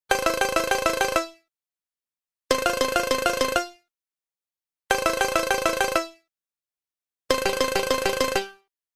htc

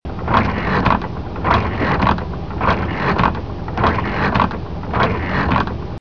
windshield wipers

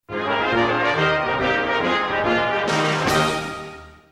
victory; kirby

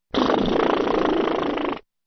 CARTOON NOSE BLOW

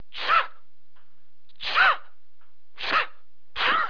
people

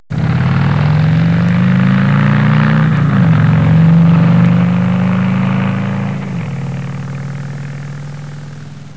vehicle

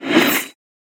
decapitation decapitation

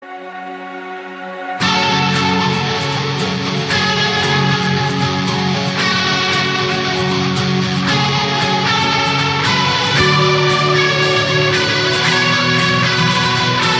ringtones; frozen

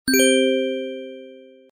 nice tono

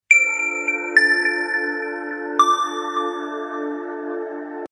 screen touch blackberry

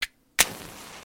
match lighting

